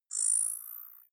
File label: zoom in light